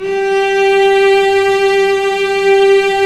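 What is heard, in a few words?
strings, mf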